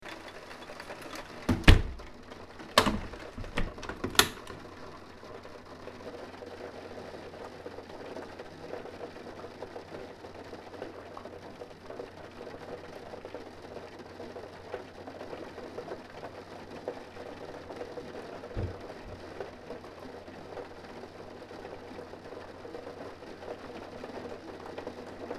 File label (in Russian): nachalo dojdia